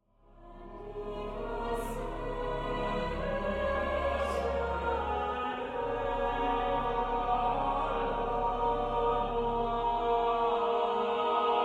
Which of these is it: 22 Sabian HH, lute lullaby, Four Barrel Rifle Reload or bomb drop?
lute lullaby